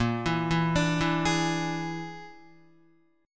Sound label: Bb 7th Flat 5th, Guitar; Bb7b5; arpeggiated; JGuitar